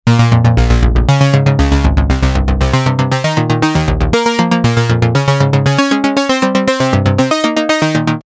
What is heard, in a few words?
Machine Bass; sequential